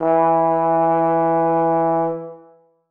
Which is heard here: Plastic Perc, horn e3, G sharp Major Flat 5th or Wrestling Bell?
horn e3